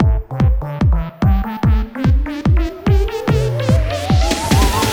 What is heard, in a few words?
Fab Slap Intro - 146 bpm; fashion police squad beta